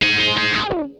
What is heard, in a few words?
RASP, Funk Guitar; 90 s Sample CDs; Zero G